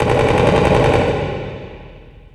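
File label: machine gun burst; gearbox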